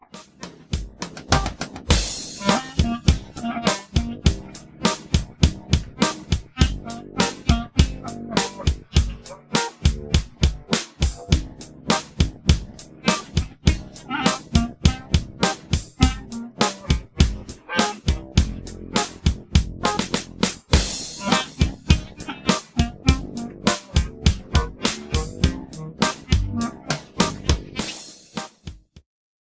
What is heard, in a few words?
percussive